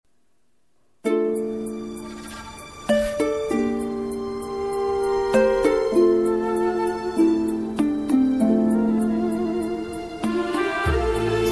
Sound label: sad tune ringtone